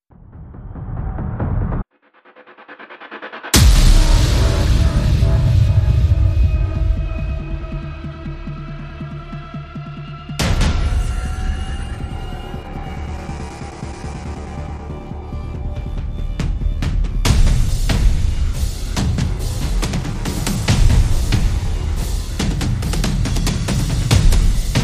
Instrumental No Vocals No Bass No Guitar

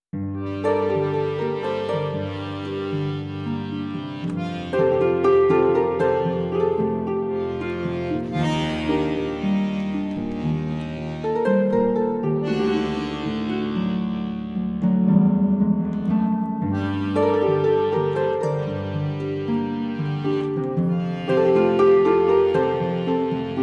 Bavarian Flamenco, No Horns

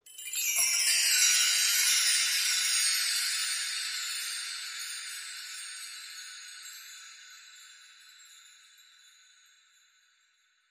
Mystical Chimes Roll

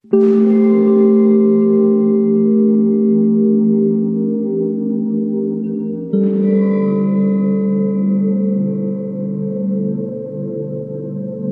Ambient

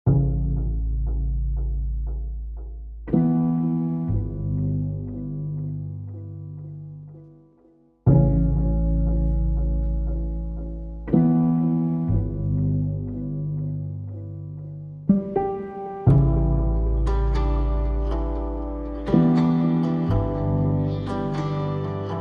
No Strings

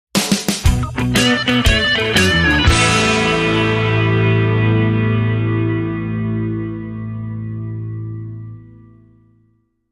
Blues Rock

